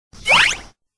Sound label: jiggle wiggle